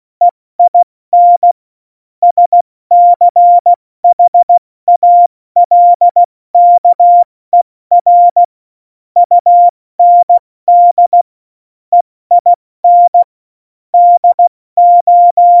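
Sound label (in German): Witz, cw morsen; Morsekurs; Witze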